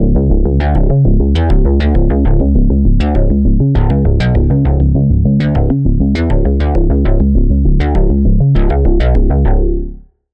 Pulse, Spire; Routed Sound; Spire Expansion - Quantum Enforcer Vol.1

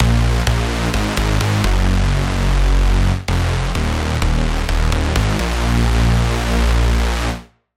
Bass, Sylenth1; Routed Sound; Sylenth1 Expansion - Star Scavenger Vol.1

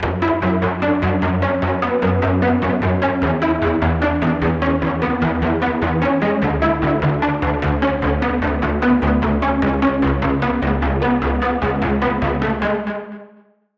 Pluck, Vital; Fractalium; Routed Sound; Vital Expansion - Star Scavenger Vol.1

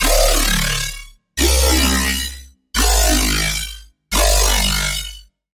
Wobble - Mechanical; Wobble - Mechanical Instinct; Routed Sound; Serum 2 Expansion - Chaos Bringer Vol.1

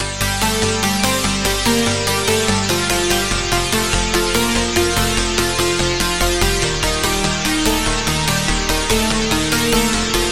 Pluck; Routed Sound; Sylenth1 Expansion - Reality Maker Vol.1

